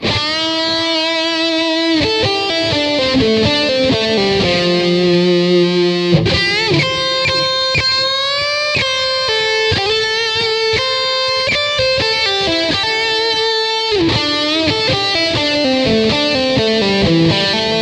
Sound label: stair solo slomo, guitar